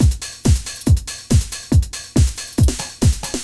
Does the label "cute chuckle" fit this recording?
No